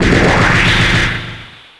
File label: PSYCHIC STREAM